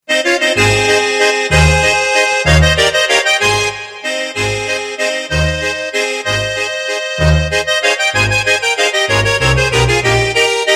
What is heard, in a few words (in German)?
Alphornlaendler